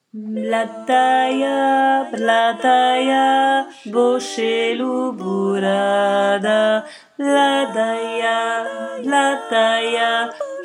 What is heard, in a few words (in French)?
Pisnicka voix Tenor